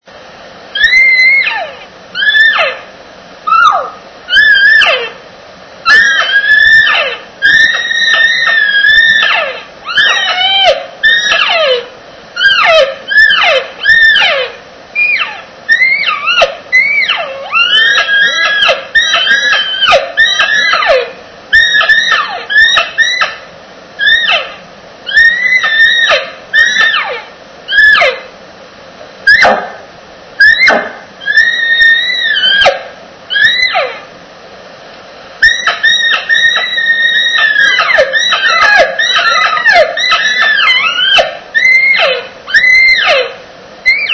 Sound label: cow, elk; elk 2001